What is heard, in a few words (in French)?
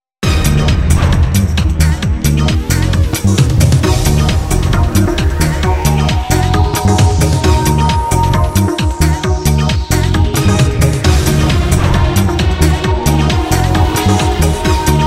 generic shopping charme